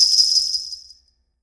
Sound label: Jpn Suzu bells